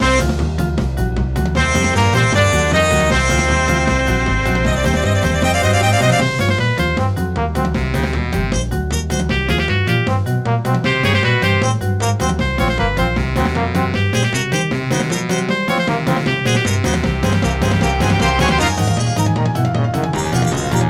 Battle; Toontown: Corporate Clash Soundtrack; Duck Shuffler Battle

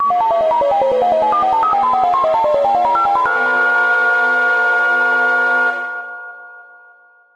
Ominous Jingle; DELTARUNE

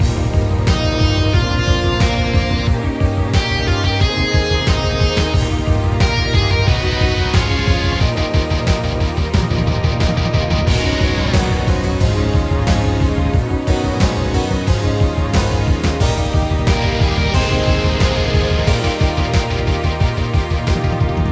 Victory Theme; Toontown: Corporate Clash Soundtrack; Cogs

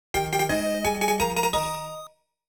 Fanfare - Pokémon Obtained; Pokémon FireRed and LeafGreen Remastered

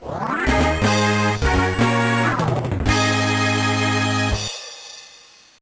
Unknown Jingle; Sonic Advance 2

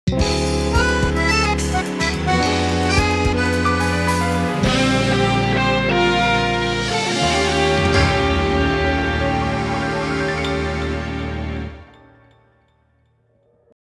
Battle Zone Activated

